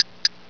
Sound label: TICK